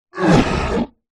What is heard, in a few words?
horror evil demonic retch cough vomit